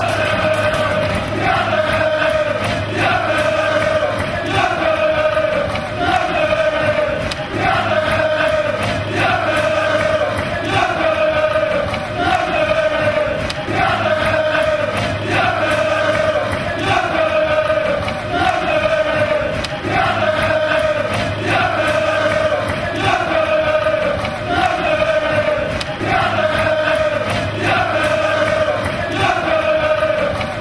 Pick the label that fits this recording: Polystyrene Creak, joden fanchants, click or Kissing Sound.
joden fanchants